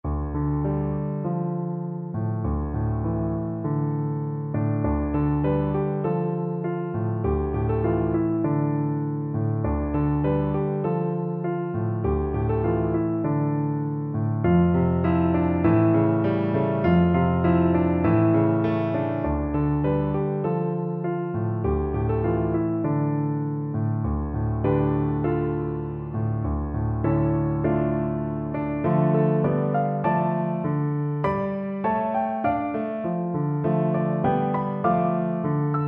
sing we now piano

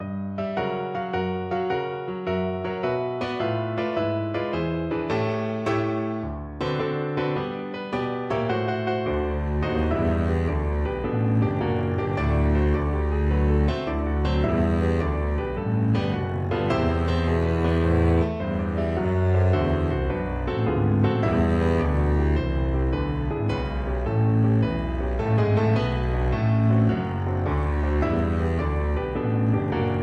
beside seaside, double bass

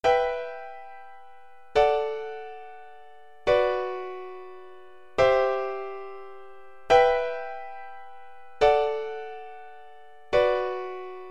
piano

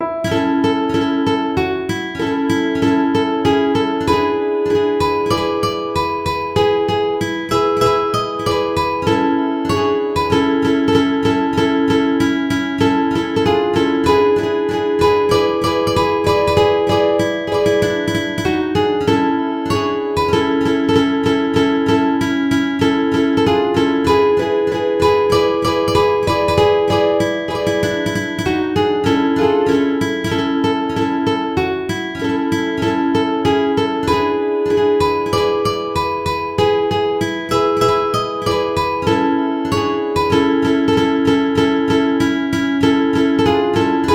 ukulele

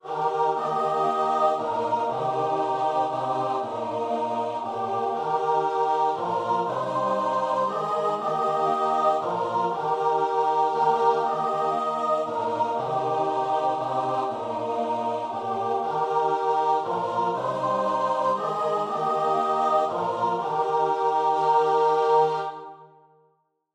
hark the glad sound, choir

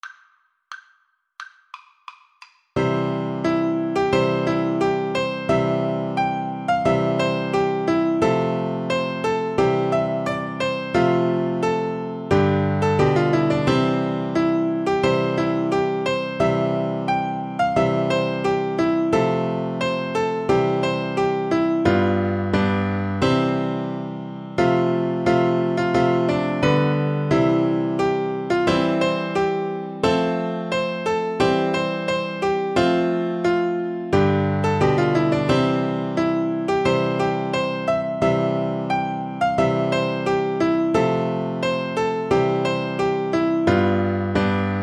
scotland the brave, Viola

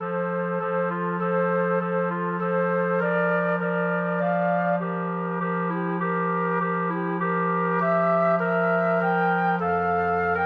wind ensemble; bach sheep

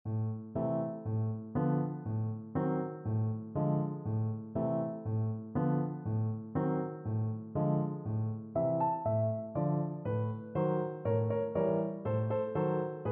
tchaikovksy sugar plum, Alto Recorder

